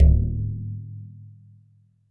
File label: percussion, Roland TD-20